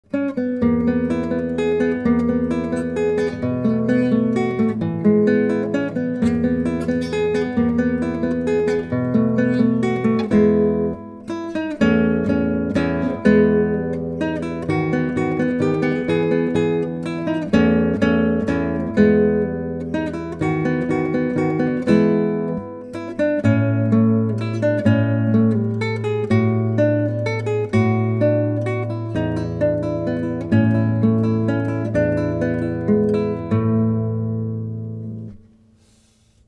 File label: moderato, guitar